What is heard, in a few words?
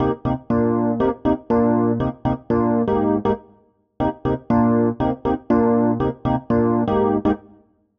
Chord El Piano Cm, 120bpm; Zero-G; Extra Chord Progs